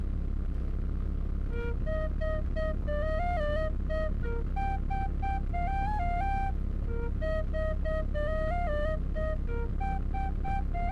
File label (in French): scottish flute irlandaise sib